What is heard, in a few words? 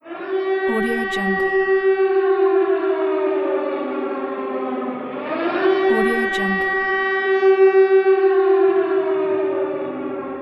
air raid siren